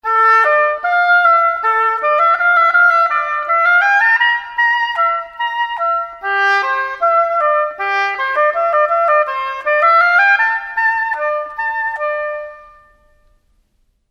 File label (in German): oboe